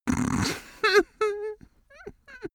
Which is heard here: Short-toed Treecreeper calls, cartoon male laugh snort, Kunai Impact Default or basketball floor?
cartoon male laugh snort